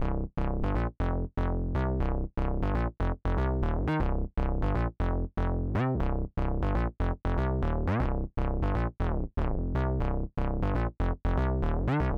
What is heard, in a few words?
Bug bass 8 bars C, 120 Bass Loops, Nu Jazz House; PowerFX - Nu Jazz House